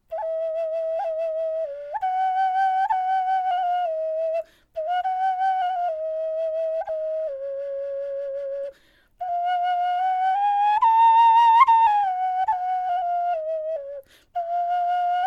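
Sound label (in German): melodie, Knochenflöten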